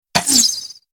arrowstorm cast; abilities

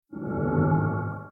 whiplash effect

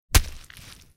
impact blunt

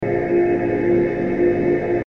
energy warmup; abilities

